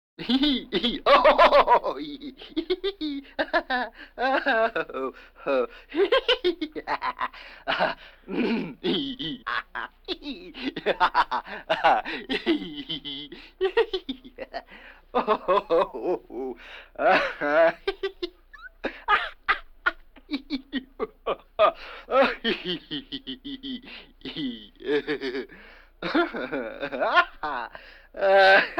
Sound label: Vocal effects breathing Moaning Baby